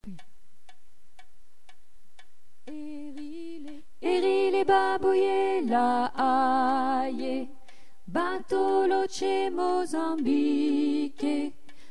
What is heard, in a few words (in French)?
basse